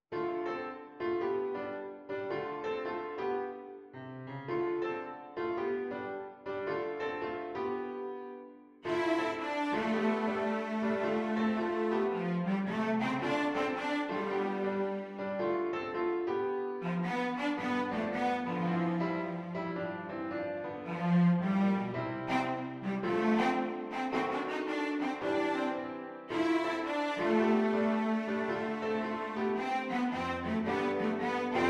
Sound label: Beautiful Wander Cello; double bass